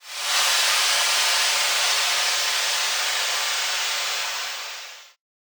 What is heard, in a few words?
steam release